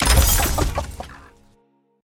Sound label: cash out